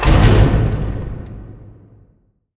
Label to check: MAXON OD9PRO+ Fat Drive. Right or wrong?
Wrong